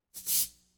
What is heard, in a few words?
Cabassas Accent Rhythm